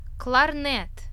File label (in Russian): klarnet